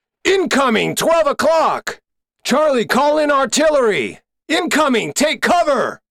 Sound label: action game